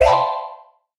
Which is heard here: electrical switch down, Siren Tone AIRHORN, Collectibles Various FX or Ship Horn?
Collectibles Various FX